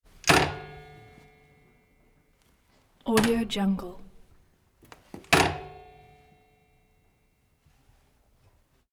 springs bed lever spring release

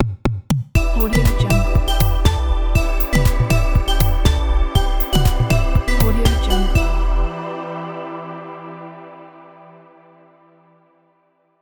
short logo

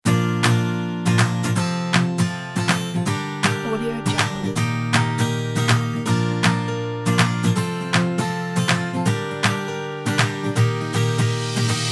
be happy

